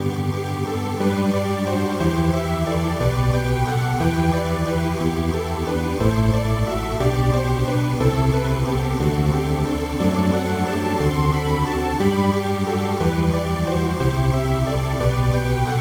polysynth

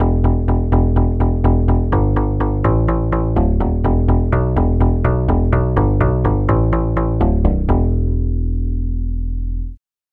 jazz guitar bass

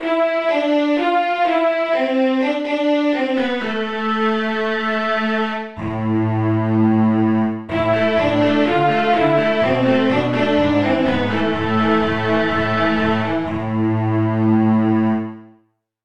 strings

